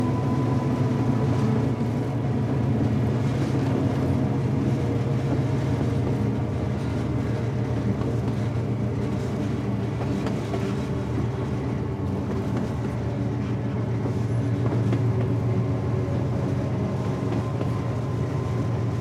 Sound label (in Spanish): TREN INTERIOR DESDE VAGÓN VA BAJANDO LA VELOCIDAD